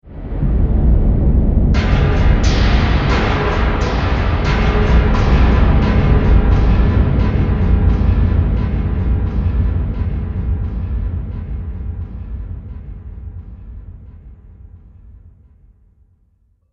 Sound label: Fantasy Scene Deadly Horror, scary soundscape